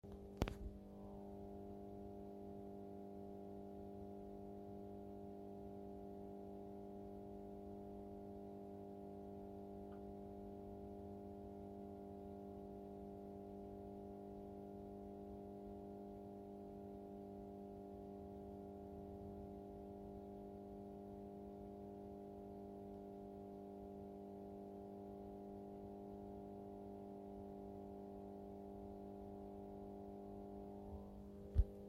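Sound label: buzzing noise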